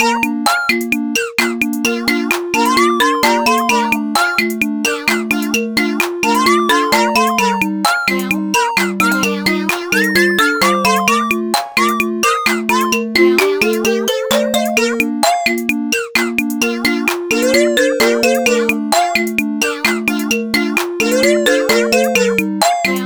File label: Meow; Meow (Full)